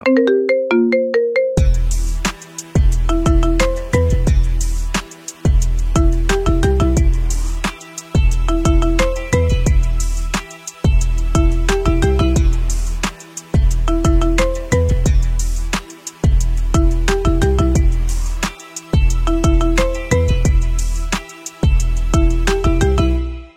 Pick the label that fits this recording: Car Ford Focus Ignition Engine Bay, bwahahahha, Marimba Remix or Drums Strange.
Marimba Remix